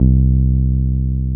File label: TB-303